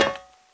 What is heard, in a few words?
fire escape; blindside